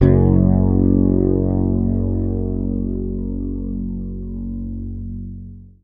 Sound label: F#, Bass; Sampleheads; Will Lee Bass Library